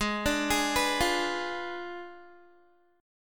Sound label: Ab Diminished 7th Ab Guitar 4E,4A,5D,5G,5C,6F; Abdim7; arpeggiated; 16,17,18,16,17,x